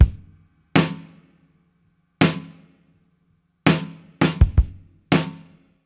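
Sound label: 82 bpm, beat loops; Zero-G; Monster Beats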